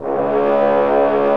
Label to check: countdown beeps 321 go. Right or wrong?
Wrong